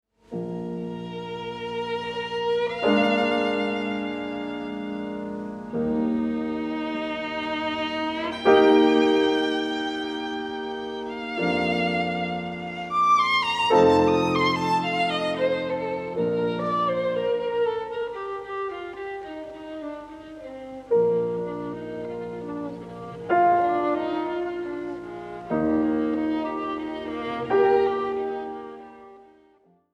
Sonata for Pianoforte and Violin No. 6 in A Op. 30 No. 1 II. Adagio molto e; Beethoven; espressivo